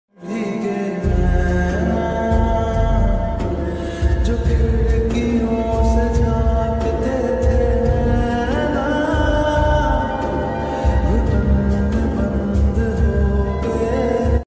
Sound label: sad ringtone